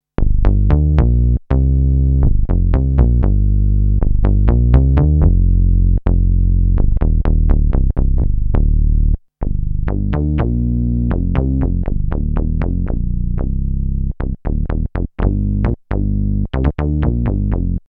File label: Roland Sound Trick